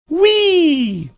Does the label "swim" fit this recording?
No